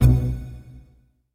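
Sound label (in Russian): всплывающее окно в операционной системе Windows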